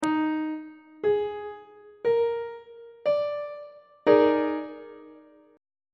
e flat major 7th suspended 4th chord on treble clef